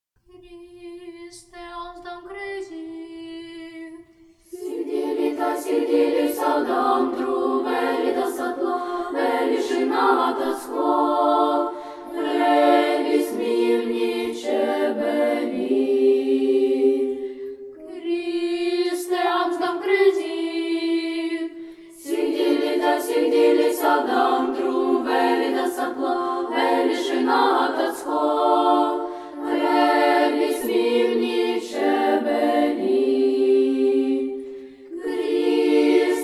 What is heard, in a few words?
Gruzinskaya Xristos voskresye